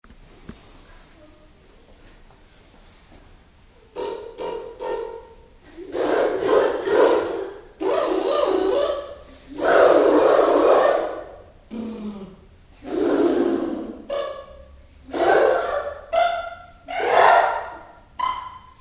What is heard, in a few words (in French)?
la voiture démarre